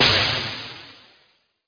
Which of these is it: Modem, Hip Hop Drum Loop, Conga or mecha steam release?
mecha steam release